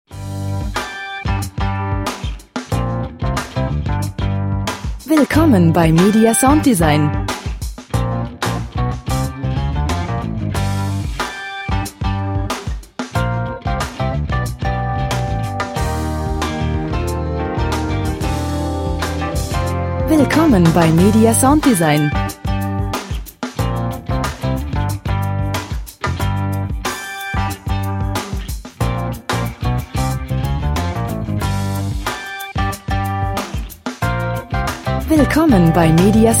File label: groovy impromptu